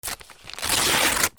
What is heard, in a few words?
PAPER